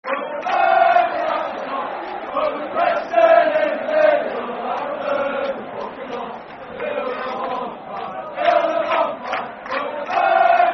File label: Football Chant (Soccer Songs); Carlisle United; fanchants-com